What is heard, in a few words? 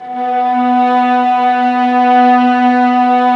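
violas, ambience, piano; String Master Series; Vlas Sect3-4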